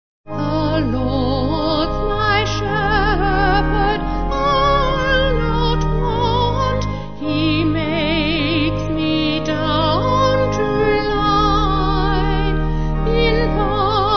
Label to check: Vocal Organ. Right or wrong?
Right